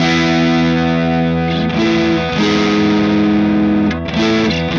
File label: Electric Guitar, Loops; Guitars